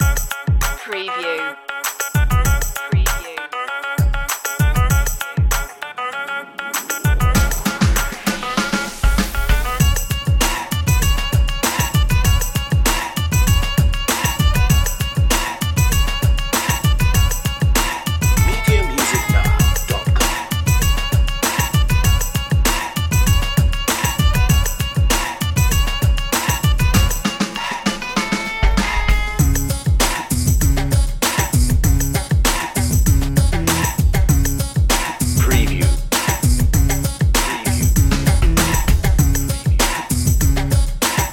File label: mutant vocal 98bpm